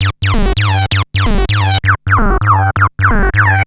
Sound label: Analoop, Industrial